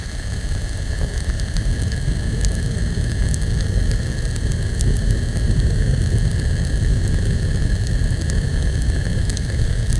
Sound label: sounds of active burning, boiling